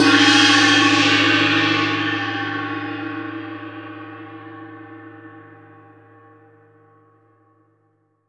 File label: CHINESE GONG; AKAI S-Series CD-ROM Sound Library VOL-5